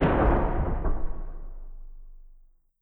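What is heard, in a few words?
Collision Interior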